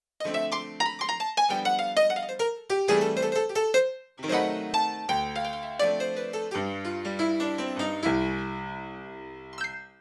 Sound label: Mic too Close, Yamaha CFIII Grand Piano; Yamaha TX16W